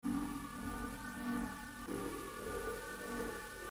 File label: noiz